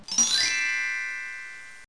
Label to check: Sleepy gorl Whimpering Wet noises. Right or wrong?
Wrong